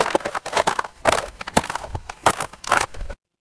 footsteps gravel

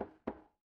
impact wood knock

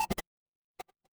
retro game combo

